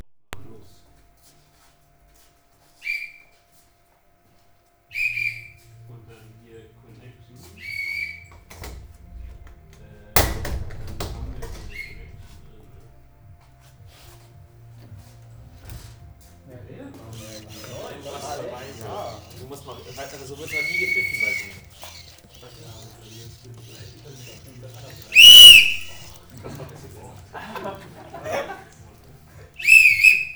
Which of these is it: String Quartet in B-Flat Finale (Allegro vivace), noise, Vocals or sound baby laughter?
noise